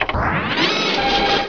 Bank window open; Net Power 1999 #12

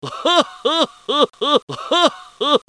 comic; GEOGRAFIA

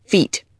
feet

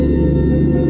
bridge hum motor; astrorally

